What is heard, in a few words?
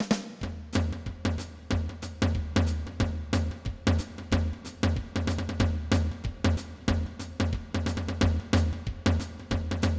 jazz swing; descript audio codec 16khz